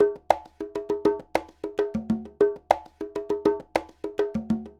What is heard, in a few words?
Conga, Latin Percussion